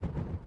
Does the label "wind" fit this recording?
Yes